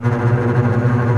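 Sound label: bass tremolo B2; Digital Sound Factory Virtuoso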